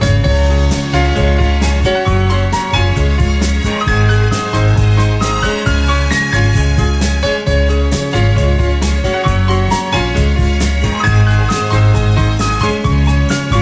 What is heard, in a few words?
Retro Punk Music